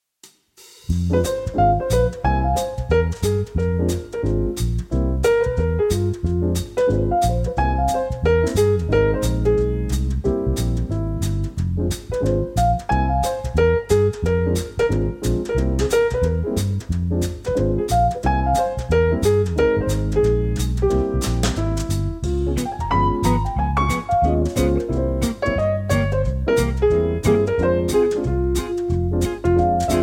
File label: music